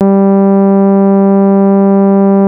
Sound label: Theremin Vintage; Atmospheres; Ableton Foundation FreePacks